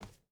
footstep burlap wood walk; Rust

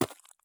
run gravel; Escape from Tarkov

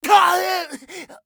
scav death, voice; Escape from Tarkov; scav1

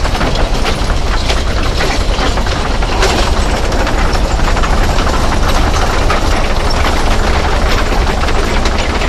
tracks heavy speed, tanks; War Thunder

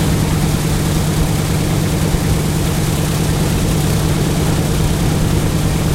b17 side, engines; War Thunder

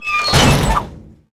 polycraft rip

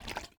bota bag slosh fast; Rust

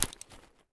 juice carton drop; Escape from Tarkov

